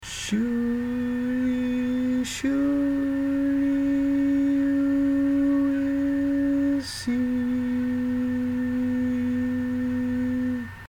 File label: Tenor